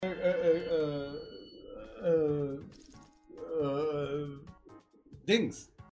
ah ah ah ahhh ahh dings

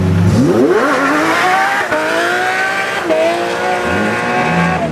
lamborghini lp670 4 sv power slide

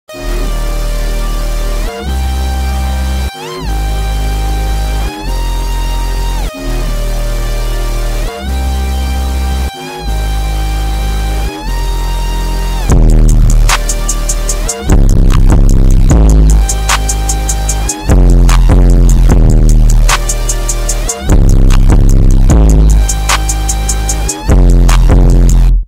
instrumental bass boosted

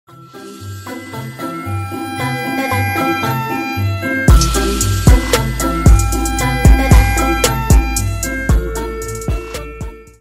trap remix

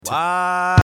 whaaaa

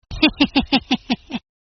laugh

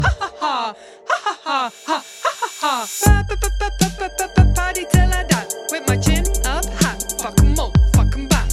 laugh

